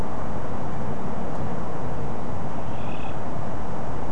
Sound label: Brown cheeked Rail, nocturnal flight call; nocturnal flight calls (NFCs)